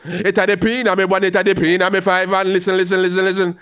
G-PHRASE, RAGGA IN G

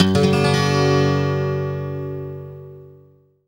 STL STRNG CRD; Best Service; World Colours

